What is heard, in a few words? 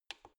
embedded button press quick